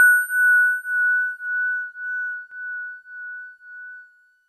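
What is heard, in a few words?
DX100 F sharp 6; David Lynch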